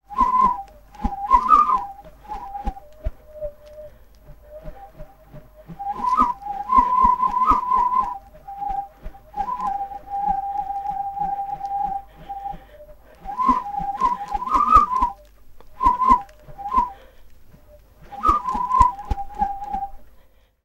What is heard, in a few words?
toy whirly tube spin various; fork media